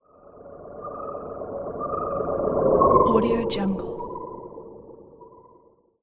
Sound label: submarine pass by